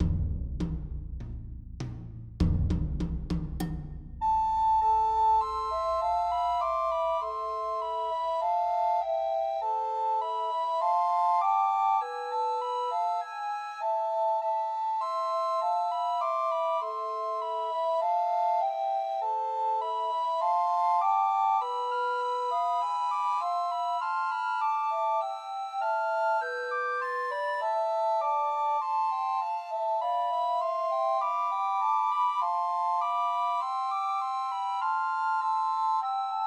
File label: Christmas Trios for Two Descant and One Treble Recorder; God Rest Ye Merry Gentlemen